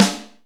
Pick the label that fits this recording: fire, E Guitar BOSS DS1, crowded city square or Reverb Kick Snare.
Reverb Kick Snare